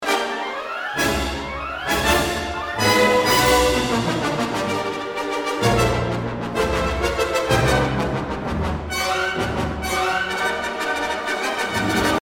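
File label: mus cbt loop, music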